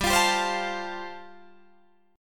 G 7th Suspended 2nd Suspended 4th, Guitar Drop D

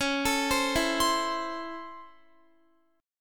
Db Minor Major 7th Sharp 5th Db down Guitar; arpeggiated; 4E,4A,5D,5G,5C,6F; DbmM7#5

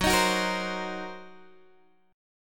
Fsharp 6th Flat 5th down Guitar DADGAD

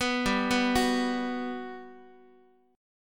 G Major 7th, G, down, Guitar; Listen to GM7 arpeggiated; GM7 Chord

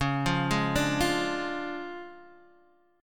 D Minor Major 7th Flat 5th C sharp, guitar

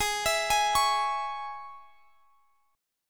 E 6th, Ab

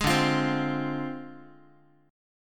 D Minor 7th Flat 5th Guitar; Dm7b5; strummed

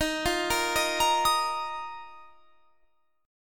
Eb Suspended 2nd; arpeggiated; 23,20,20,20,23,23

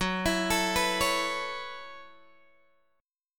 F sharp Minor 11th, Guitar, Open G

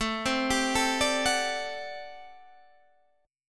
A Minor 13th, Guitar, Standard; Am13 arpeggiated; 17,15,17,14,15,14; JGuitar

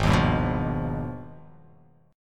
G sharp Minor Major 13th; 4,6,5,4,5,x,x,x

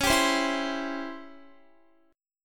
Db Minor Major 7th Flat 5th Db down Guitar; strummed

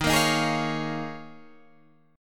D sharp 7th Suspended 2nd Suspended 4th

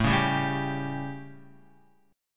A 7th Guitar, chord; strummed; JGuitar

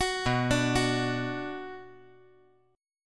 B-Minor

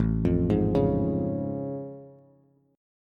A Augmented Major 7th A Bass; arpeggiated; 5,8,6,6; JGuitar

